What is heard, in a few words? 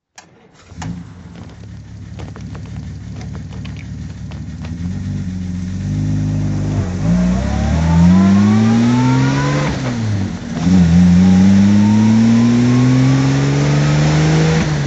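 jaguar xk120 ots drive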